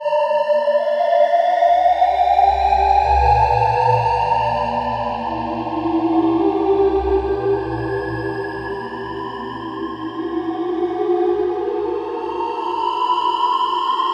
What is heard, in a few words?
spirits, Textures